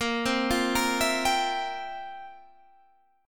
F Major 7th Suspended 2nd Suspended 4th, Bb, guitar